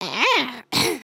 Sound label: trip, emotes